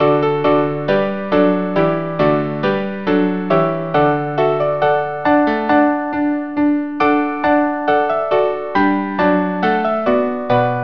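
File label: string quartet; Richard I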